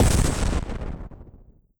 explosion

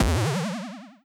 bounce boing jump

